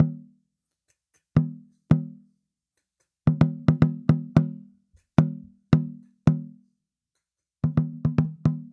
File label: Tambora, African